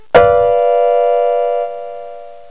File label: PIANO; NOTES